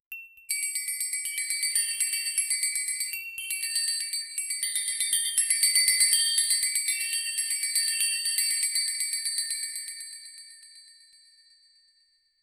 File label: short transiti, festive